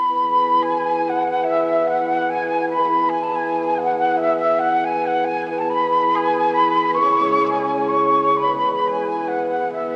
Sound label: morning music